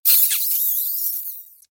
mrat make hit; STALKER; customnpcs

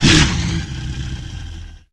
take hit, mutants; dog; STALKER; customnpcs

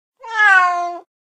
mcat idle; STALKER; customnpcs

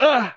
freedom hit; STALKER; customnpcs

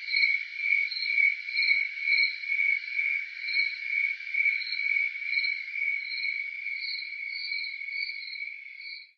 ambient cricket; STALKER

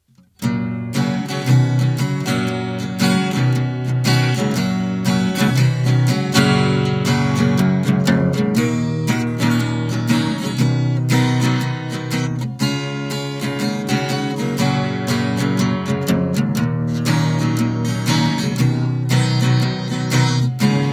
guitar; STALKER

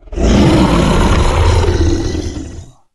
giant idle; mutants; STALKER; customnpcs

